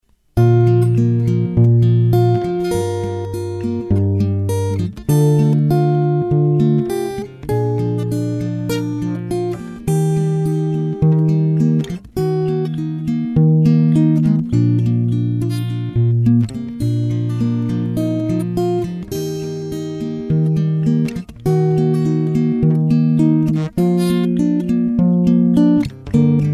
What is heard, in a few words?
Guitar